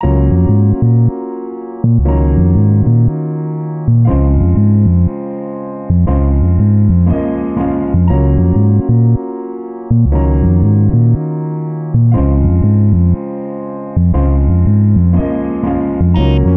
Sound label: Spring No Drums